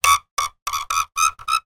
wood pole turn squeak; foley; zapsplat

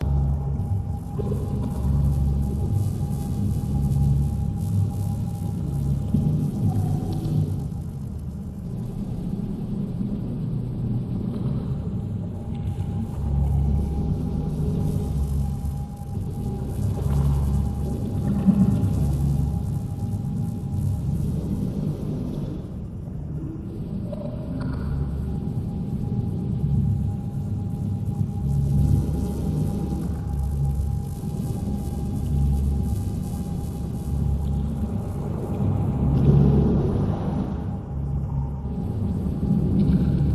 space drone; Audio Mangler

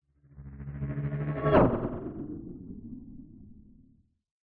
science fiction hovering vehicle pass by fast

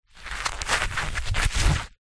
leather thin movement handling; foley

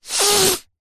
human sniff hard blocked nose; zapsplat

